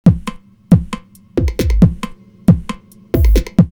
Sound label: drumloops Techno House 136; Clonky Tics; X-Static Goldmine